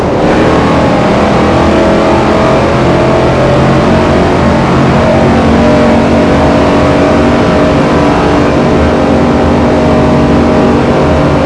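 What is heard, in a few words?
fourth cruise, car engine; chevelless; vehicles; tdmcars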